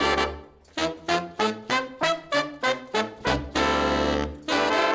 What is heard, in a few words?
Horns Section